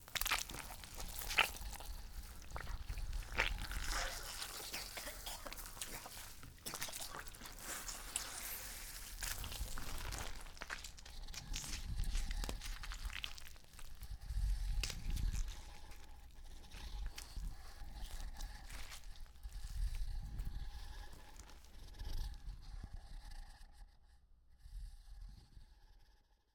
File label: disfigure; casualtiesunknown